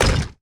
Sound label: axe mining; factorio; teflilly